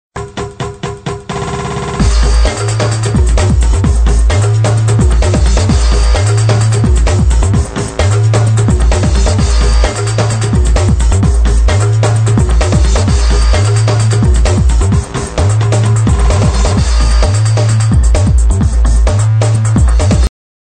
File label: Beats For Techno Ringtone